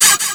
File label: small door creak